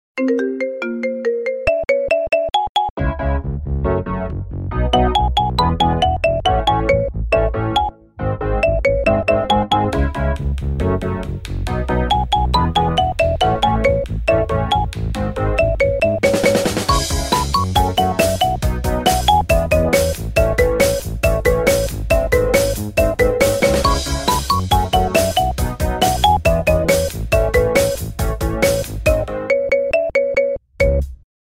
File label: Marimba Ringtone